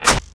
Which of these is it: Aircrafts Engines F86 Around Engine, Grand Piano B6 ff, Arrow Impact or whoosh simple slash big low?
Arrow Impact